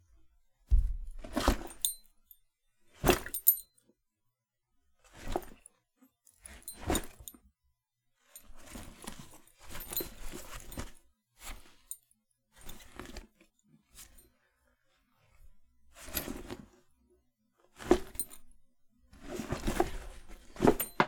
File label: Bag Drop And Remove